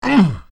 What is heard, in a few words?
pain; tyril